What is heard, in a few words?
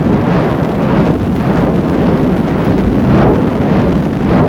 flame loop; Rust